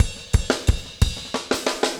motown 120 bpm, loops

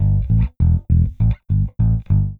Slinky Melodic Gtr, Rock, Guitars

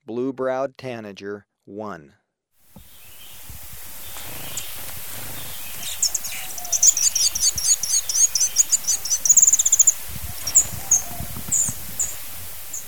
Blue-browed Tanager, Birds of Ecuador; S. America

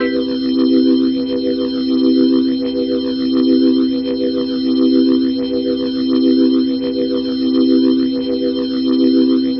Glowing Organ C Maj; Major 7; The Vitale Organ

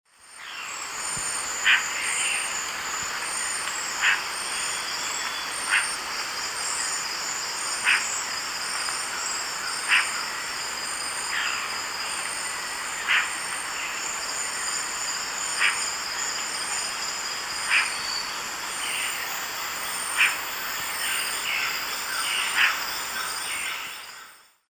Conopophaga peruviana, alarm call; S. America; Eastern Lowlands

